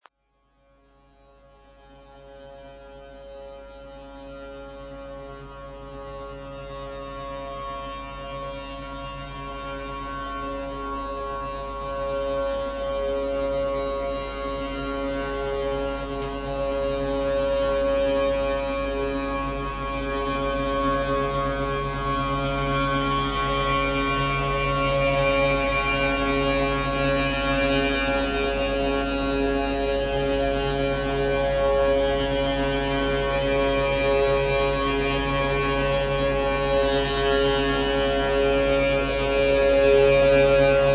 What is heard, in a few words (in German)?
Harfe